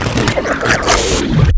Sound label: DROWN; QUAKE2; megaman